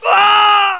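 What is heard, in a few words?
player death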